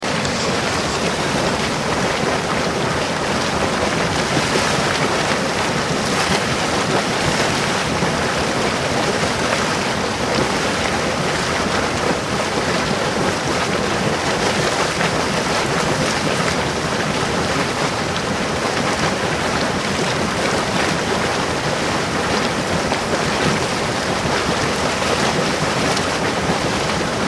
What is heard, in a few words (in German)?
Kochendes Wasser